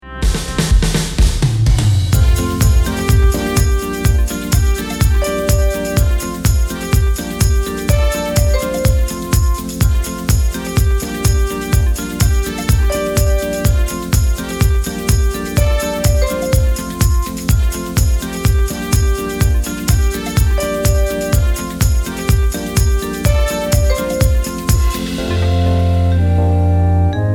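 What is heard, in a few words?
drums playback; Klimaglocken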